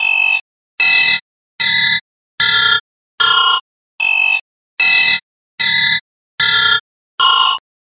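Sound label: Metal ring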